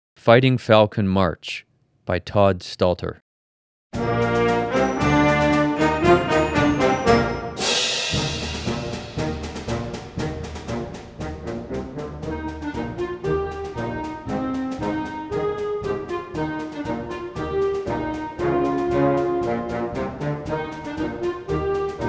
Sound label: March